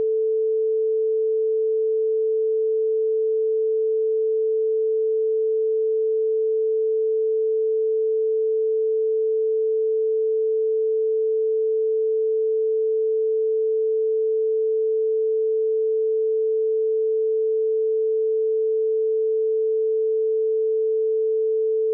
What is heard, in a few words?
tone opus